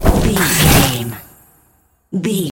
spell harness magic

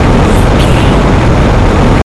sci-fi vehicle spaceship jet engine loop

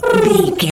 pigeon call calm

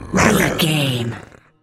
zombie voice general emote

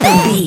retro impact colorful

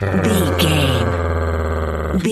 wolf growl

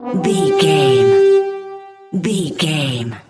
battle viking horn call close

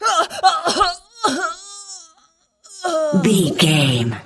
voice female death

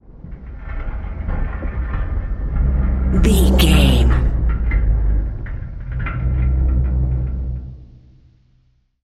metal low creaking ship structure

